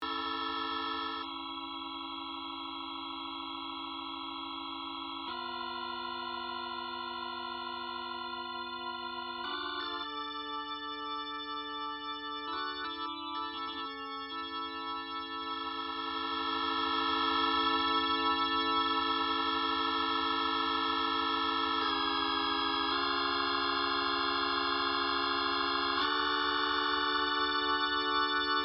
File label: org dry